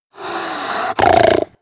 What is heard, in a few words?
Cougar Vocal; Animals; XBOX; BassProShopsTrophyHunter2007